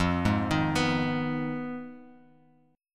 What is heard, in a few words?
F Minor 7th Flat 5th F down Bass Standard; Fm7b5 arpeggiated; JGuitar